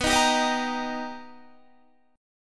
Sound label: B Augmented Major 7th B Guitar, chord